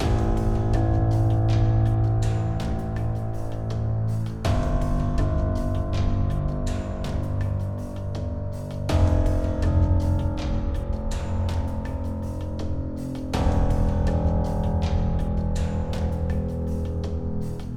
A 1-4 (Bass Line)

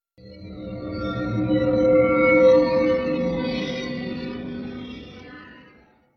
Stinger Decrease Action; Naughty Bear: Panic in Paradise; ps3; xbox 360

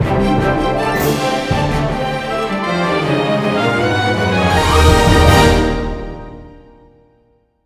Rich Jingle

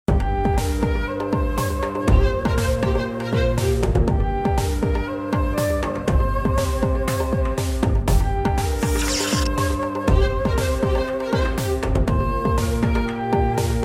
ending audio; Itadaki Smash

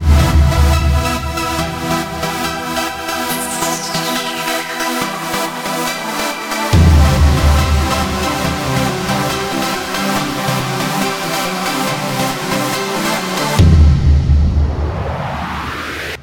Hyper Jump Music; super mutant alien assault; ps vita

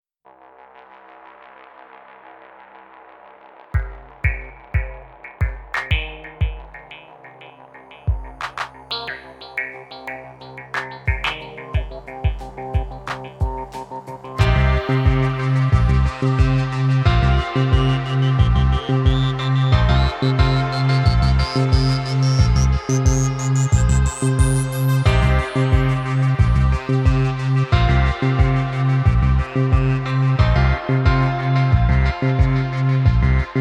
Ingame Music; Pulzar; PS Vita

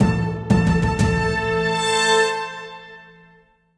Medieval Dines (Intro); Cooking Dash

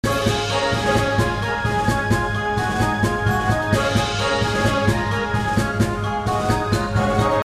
Bgm Win

